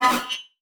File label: Multimedia Internet CD-Rom Flash Short